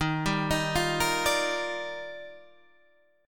Eb Major 9th, Guitar Half Step Down

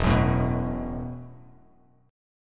E Suspended 2nd Suspended 4th E, Bass Standard

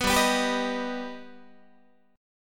G Augmented, Guitar; G+ strummed; 14,15,14,17,15,14; JGuitar

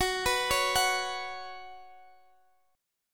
F sharp Suspended 4th, Guitar, Open G; x,x,16,16,14,16; down

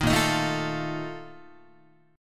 Bb Major 7th Suspended 4th, Guitar; strummed

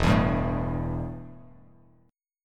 E Minor Double Flat 5th E, Bass Standard; strummed; JGuitar

